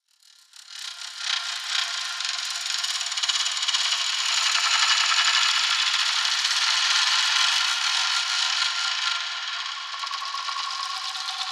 Wire Shudder; One Shots; FX; Ableton Live 12 Lite; Core Library

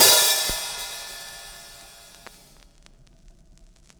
Hihat Open; One Shots; Drums; Grimeoh

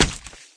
splat hit, paintgun; There; ThereClient

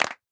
Clap; Ableton Live 12 Lite; Core Library; Drums

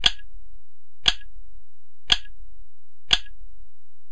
tick; To the Moon

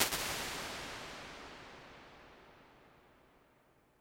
Halls Digital Long Hall; Impulse Responses; Ableton Live 12 Lite

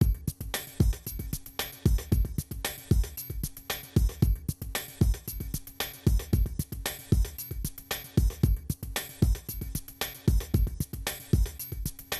Groove; Microsoft IntelliPoint

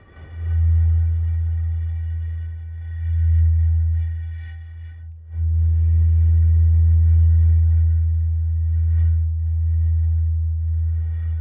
Whistle Stone Wine E Loop; Journey

